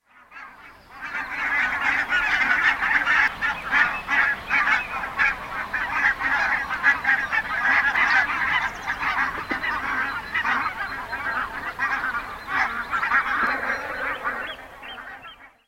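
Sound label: barnacle goose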